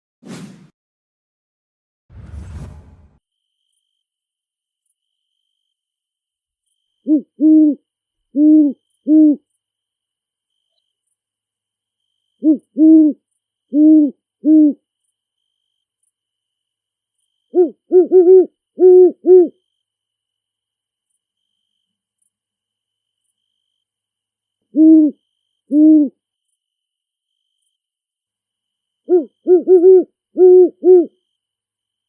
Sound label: Great horned owl call from big forest; Red Tailed Hawk